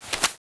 OICW move grenade, weapons; cstrike

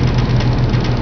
machine tack; cstrike

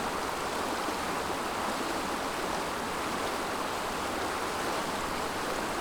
River; cstrike

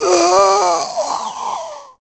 die chainsaw; cstrike; LeftForDead; jailas

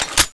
crossbow clip in; weapons; crossbowex21; cstrike

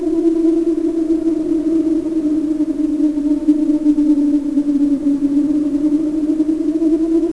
cave whine; cstrike; kz ins pubjumps

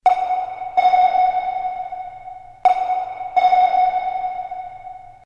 submarine sonar; cstrike; kz kzsca toonworld3

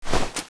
draw; weapons; kronos1; cstrike

